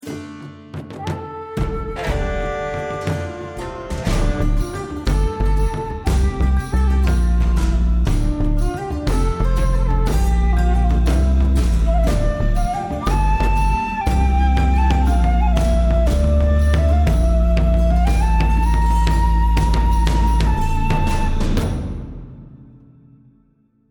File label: choose team